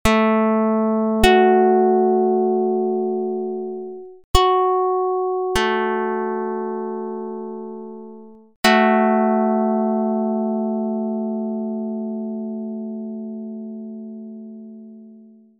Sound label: pluck; Jid 32/19